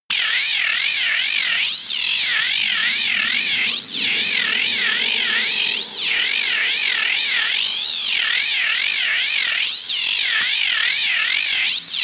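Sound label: bubble wobble high, science fiction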